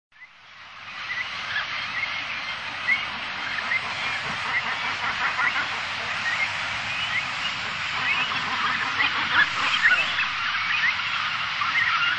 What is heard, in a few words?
Phalacrocorax filamentosus; Sakhalin; Kuril; sea; priroda sakhalina i kuril